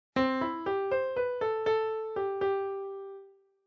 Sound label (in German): Balkensatz